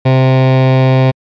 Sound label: fail buzzer